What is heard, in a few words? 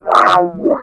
gurp; openffa; gunner